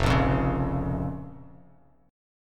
F Suspended 4th Sharp 5th F Bass Standard; strummed; 1,1,3,6; JGuitar

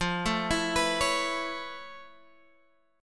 A Major Add 9th F Guitar Standard, chord

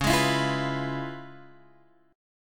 C sharp 7th Sharp 9th Guitar Open G, chord

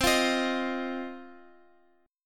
C Minor Double Flat 5th C down Guitar Open F; Cmbb5 strummed; JGuitar

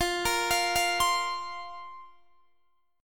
F Suspended 4th F, Guitar Standard; Fsus4 arpeggiated; x,20,20,22,18,20; JGuitar

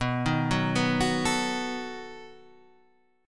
B 7th B down

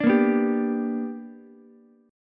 B Minor Major 7th Sharp 5th B, Ukulele; strummed; JGuitar

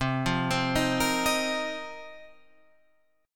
A sharp Suspended 2nd Suspended 4th C

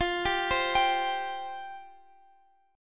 F Suspended 2nd F down Guitar Drop D

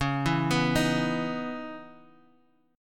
C sharp Minor 9th, Guitar, Open G; 11,9,9,8,x,x

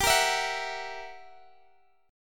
F sharp 7th G; strummed; F#7/G

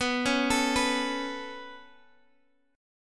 B 7th Suspended 2nd, Guitar, Half Step Down

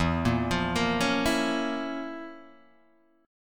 F Major 7th Suspended 4th Sharp 5th

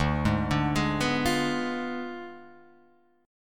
D Diminished 7th, D, down, Guitar Open G

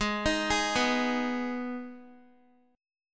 Ab Minor Ab down Guitar; arpeggiated

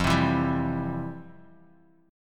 F Major 7th Suspended 2nd, F, down, guitar; strummed; JGuitar

